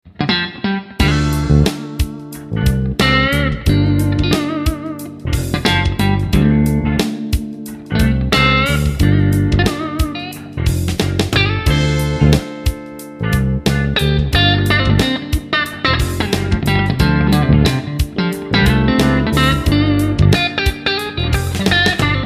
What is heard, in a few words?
Groove in D Minor; admiralbob77